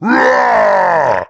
male uaaaa; human

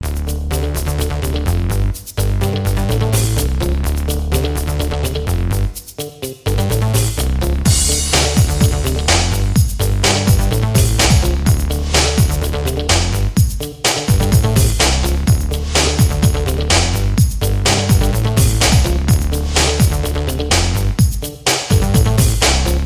ringtone techno, instrumental

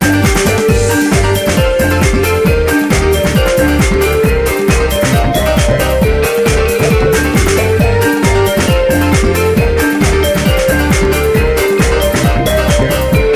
ringtone dance, instrumental

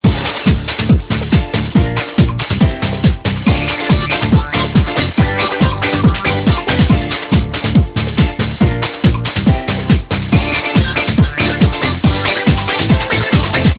ringtone dance, instrumental

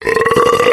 buurp; human

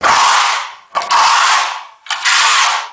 putty, industrial

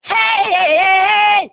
male heeieei; human

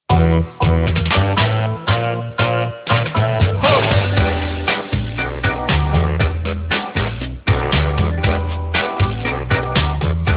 ringtone cool jazz

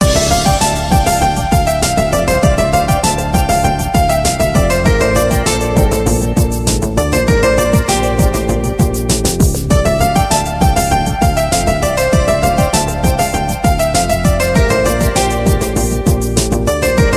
ringtone newage

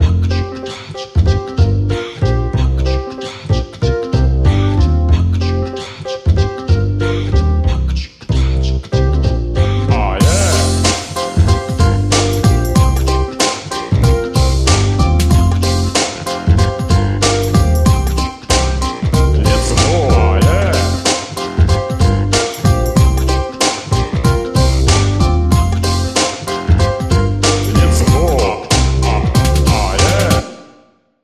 ringtone slow techno

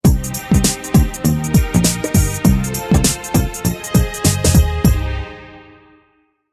ringtone pop shuffle, instrumental

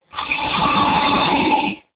snore; human

